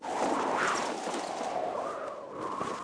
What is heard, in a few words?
Scrolling Flag Fast